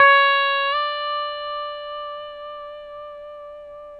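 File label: pedal steel bend up C#5, Right; PEDSTL BENDS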